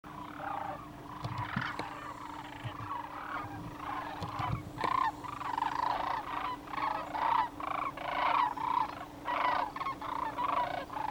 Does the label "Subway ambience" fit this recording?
No